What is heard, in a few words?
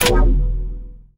grenade activate; weapons; Half Life Alyx